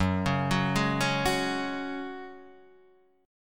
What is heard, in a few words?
F sharp Suspended 2nd, F sharp, chord; arpeggiated; x,x,4,6,6,3,3,3; down